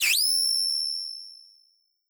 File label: flash high